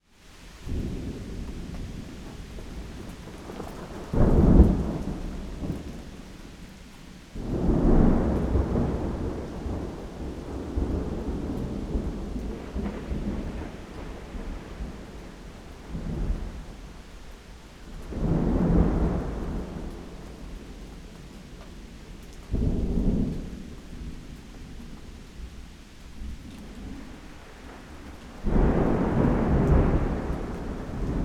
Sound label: Thunderstorm Active